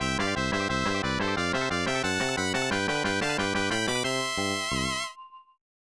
Stage Clear; Araiguma Rascal; SNES

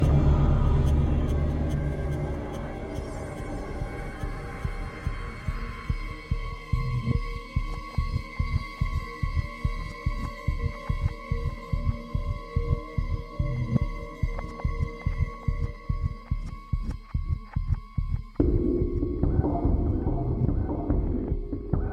Explore Drone

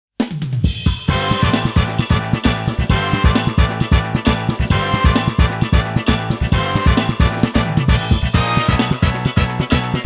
Best Goal Replay BGM

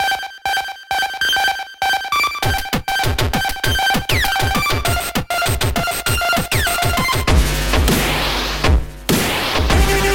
Level Cave; thunder paw; ps vita

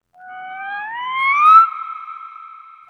jungle; The Wild Thornberrys Movie

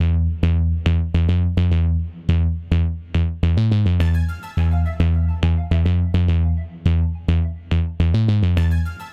Underground Miniboss Intro

